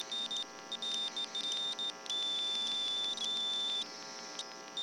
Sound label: spaceship terminal thinking